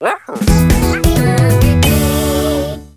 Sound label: Banner Jingle; rusty s real deal baseball; nintendo 3ds